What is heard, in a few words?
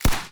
Cannon